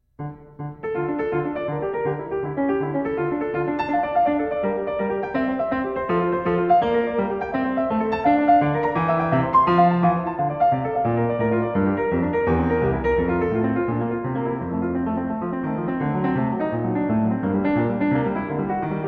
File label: Prelude in D Minor, Well-Tempered Clavier; Prelude No. 6; Book I, BWV 851